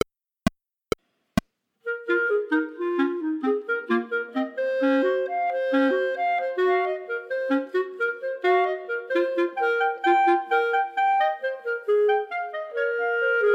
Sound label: 3 Pieces for 3 Bb Clarinets minus 3rd part